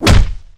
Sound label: punch; hotline miami